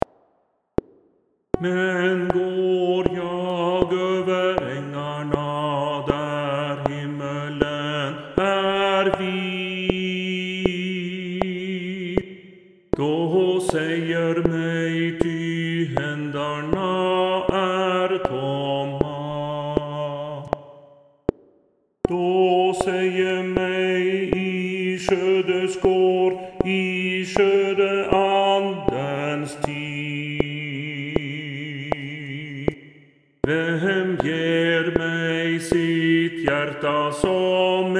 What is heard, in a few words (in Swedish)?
Tenor